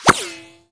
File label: UI Back